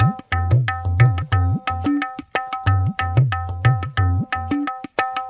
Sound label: tabla basic, drum loops and breaks, 090 bpm